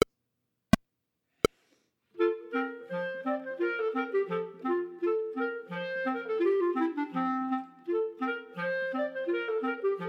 Gavotte en Rondeau, Chamber Music for 3 Clarinets, minus 3rd part; Dandrieu; Caboodles of Clarinets, Trios, Vol. 3